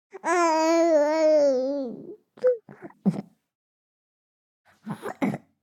BABIES BURP Boy 3 months; Baby Boom

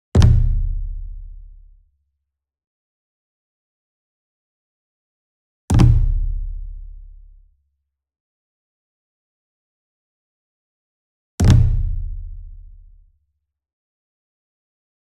ENSEMBLE DRUM Flam Near; boom library; Cinematic Strikes Construction Kit

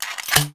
Gun Draw Select Weapon, Weapons Foley; SFX Kit

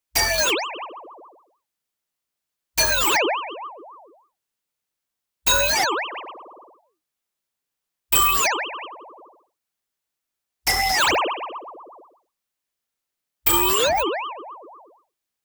BLING Metal Synth Impact Warp Modulated; boom library; Anime Essentials

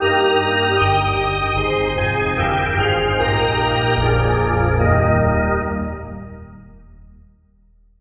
Hockey Organ Oh Canada

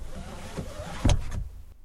seatbelt withdraw; Apotheorasis

